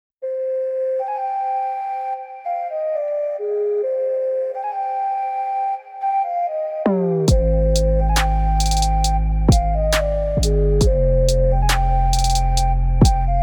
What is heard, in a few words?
Ocarina Top line